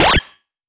Jump, 8-Bit; 8-Bit Kit Volume1

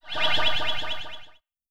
Zap FX, 8-Bit; 8-Bit Kit Volume1

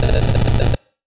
Noise, 8-Bit; 8-Bit Kit Volume1

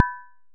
impact Glass medium; spookonomics

